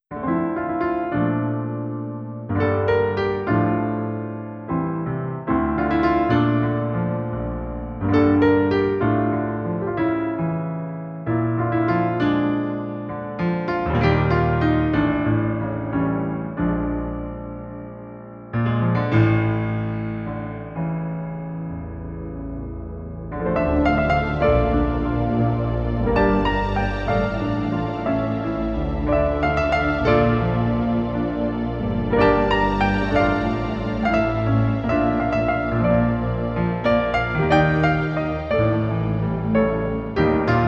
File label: Falling Leaves Rumba